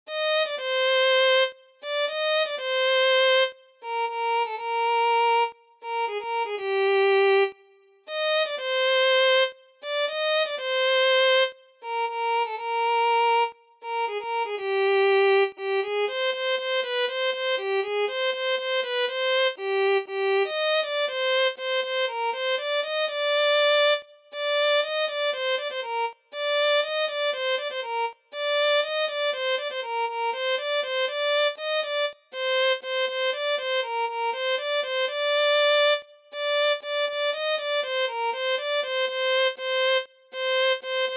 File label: Violin